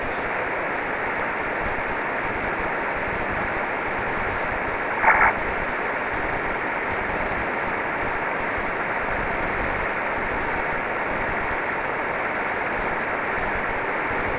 burst; MS 144; yo5kai

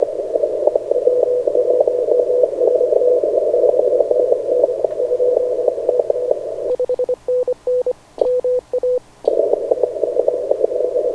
Ukraine 160m weak cw; 2009 arrl cw

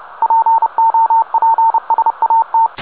cw, satelite

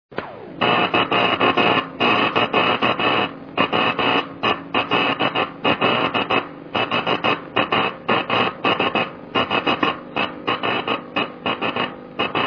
spark; w3df; sterling

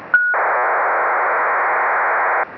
HF ACARS

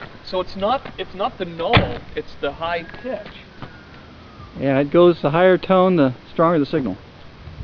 Squeaker signal

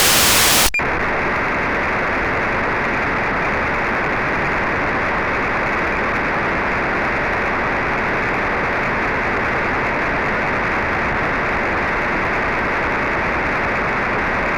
System Fusion Discr Out; yaesu

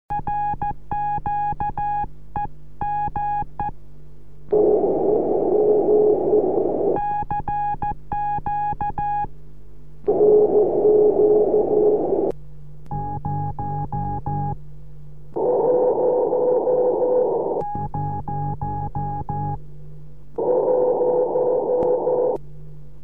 echos

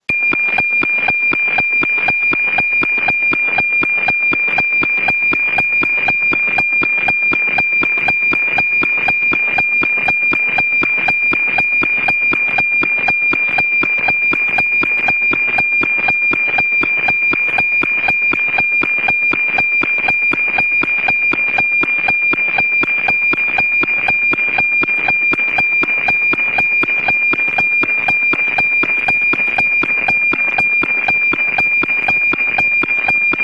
Noaa17

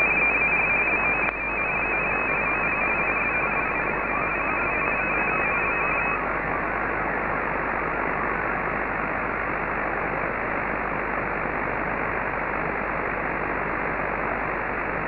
on 20m burst

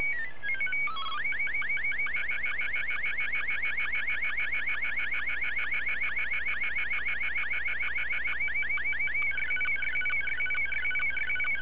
sstv

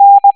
n tone

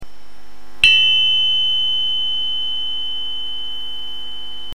Ping; VFD-Regen; This is the "PING" sound heard in the headphones when the detector tube is lightly "tapped" with a pencil due to microphonic effects

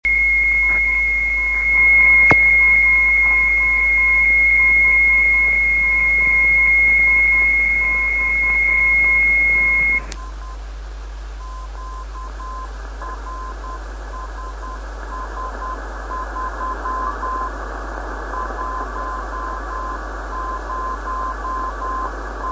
10142khz; ik1hgi

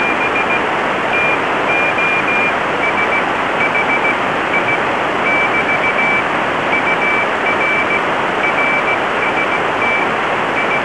morse; vk1krf

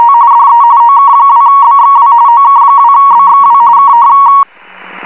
baudot

